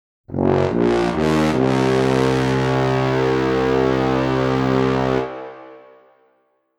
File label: Tuba; Rehearsal Tracks